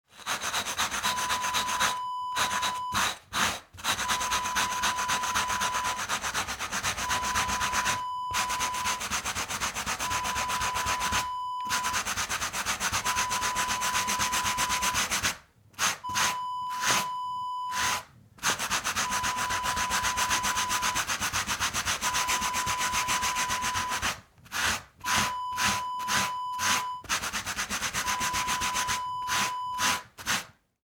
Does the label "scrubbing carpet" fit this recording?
Yes